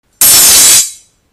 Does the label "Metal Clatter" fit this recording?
Yes